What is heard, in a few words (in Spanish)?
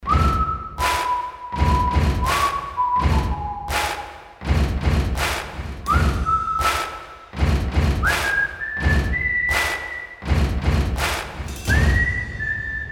tono movil; burgos